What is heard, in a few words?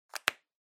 Felt Tip Pen Close